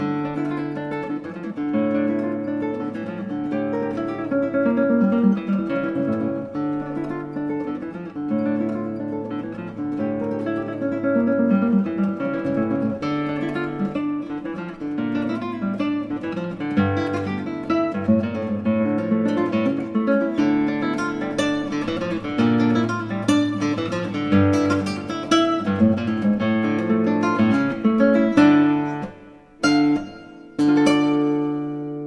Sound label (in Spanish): Guitarra Präludium; Emilia Giuliani